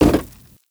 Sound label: debris cardboard, bullet impact debris